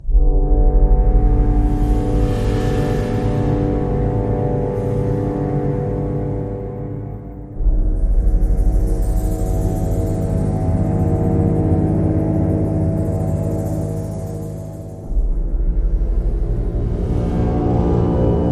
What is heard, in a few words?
Creepy Laboratory